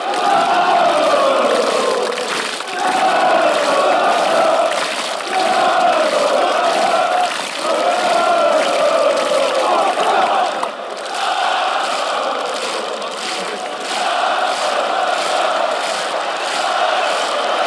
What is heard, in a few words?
woah polska